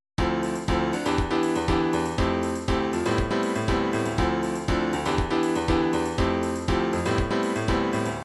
piano, midi

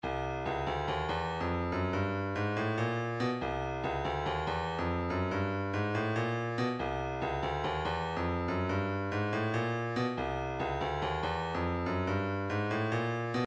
142 hihat

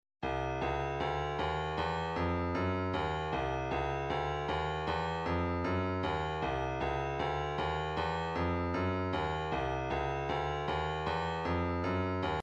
155 tambourine

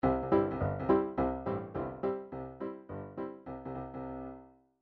chord lines ragtime; Midi Files 1000

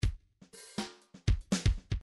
bdf midi file grooves funk a funk a

